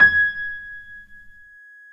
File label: PNO MF; Synclavier Sampler Library